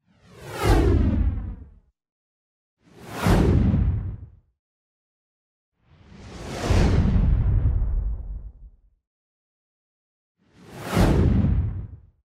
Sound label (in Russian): zvuk kosmicheskogo korablja